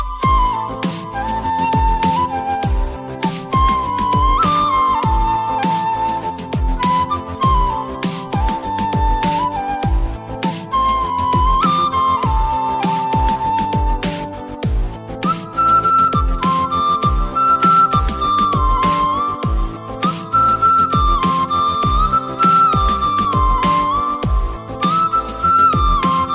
malayalam ringtone

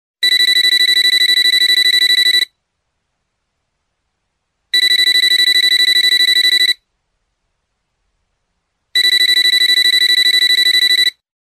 long mobile beep musical tone ringtone

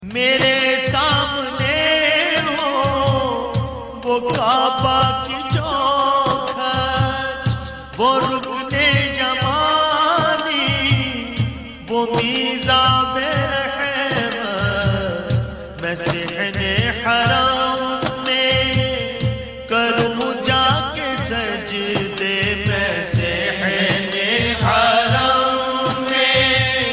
naat ringtone, islamic ringtones

